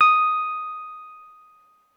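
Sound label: deep D#5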